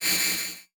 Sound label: Digital; Adobe; Multimedia Internet CD-Rom Flash